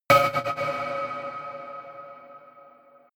energized fuse; whooshes-rises; hits-impacts

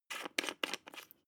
glass pepper cap unscrew; berufe; gastronomie; soundpool

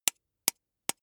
soda can tap finger soft; sport freizeit

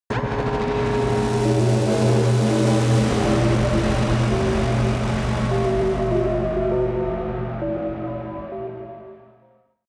buzz crying bells, drones; musikelemente

